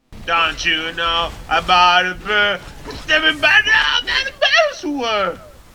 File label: bird; churro